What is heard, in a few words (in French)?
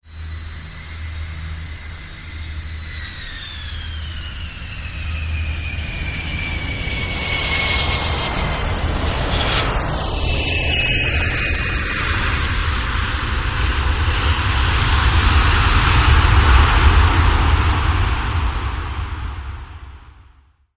747 atterrissage